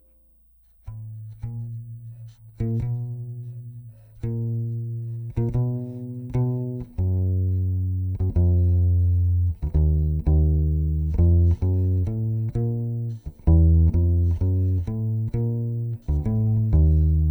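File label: Contrabass DI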